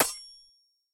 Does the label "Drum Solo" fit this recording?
No